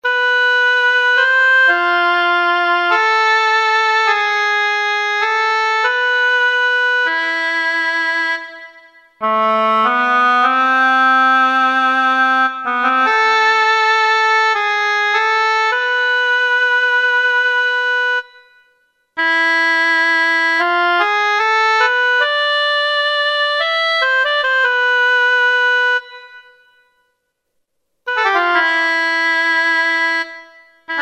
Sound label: Orchestral Oboe, Kurzweil K2000; Kurzweil K2000 Orchestral ROM